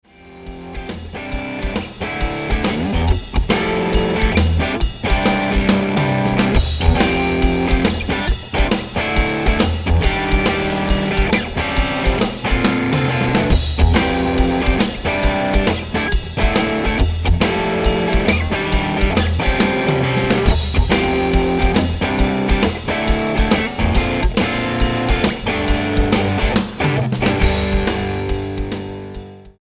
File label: Modern Crunchy Chords Studio